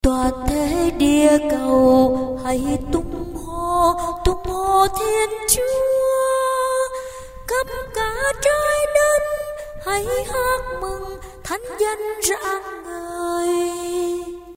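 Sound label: soprano tenor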